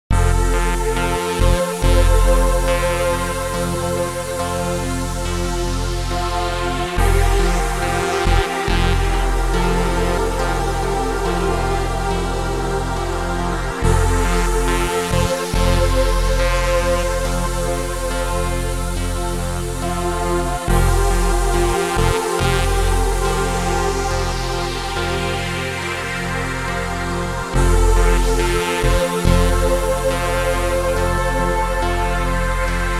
music for relaxation; dvorak

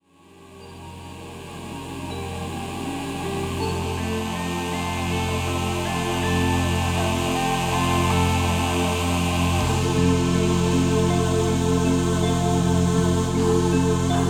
music for relaxation; bach

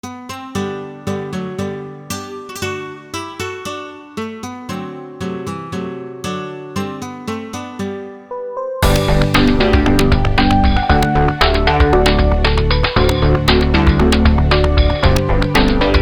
loure from the cello suite no.3; Bach’s “Loure” from the Cello Suite No.3 BWV 1009

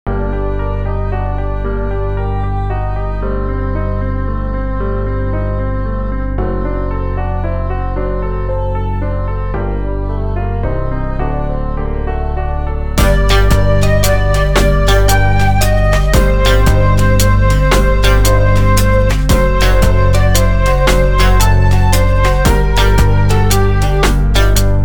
siciliano from flute sonata; Bach’s Siciliano from Flute Sonata No.2 BWV 1031

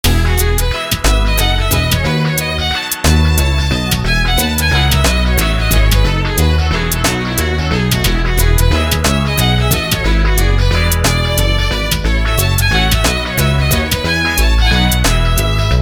violin concerto 3rd movement; bach; bwv 1042

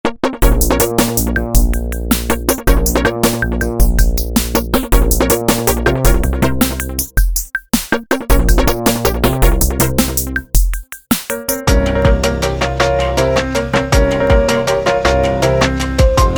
fantasy piece soaring; Schumann’s Fantasy Piece (Soaring)

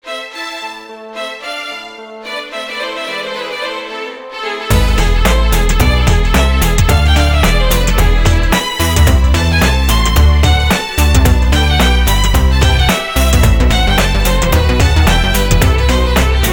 violin concerto in a minor; Bach’s Violin Concerto No.1 in A minor 1st movement BWV 1041

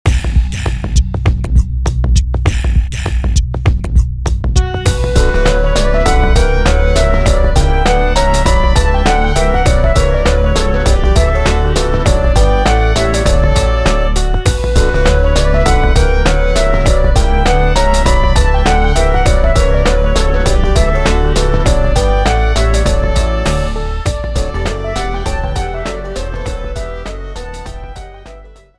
orchestral suite bourree; Bach’s Orchestral Suite No.2 (Bourrée) BWV 1067

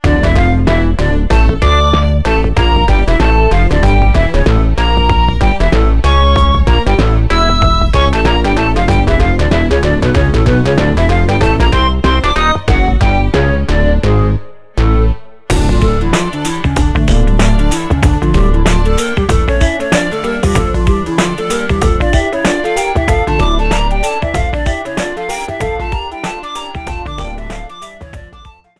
concerto in d minor 1st movement fragments; Bach’s Concerto in D minor 1st movement (Fragments) BWV 1052

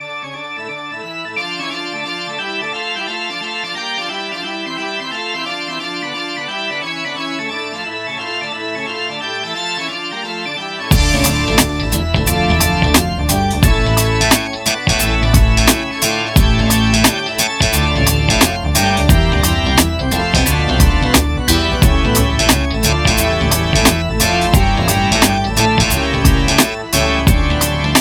toccata d minor; bach; bwv 538

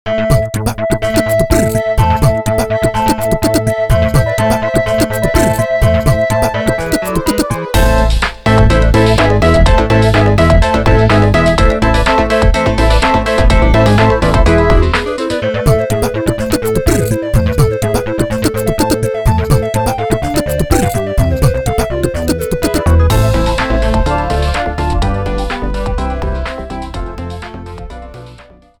concerto in a minor 1st movement; Bach’s Concerto in A minor 1st movement BWV 1065

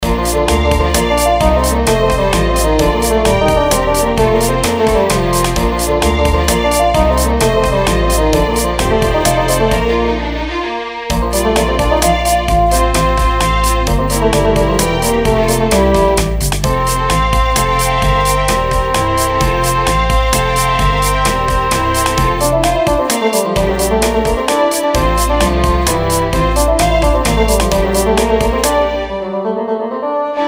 Concerto for Bassoon B Major 3rd Movement

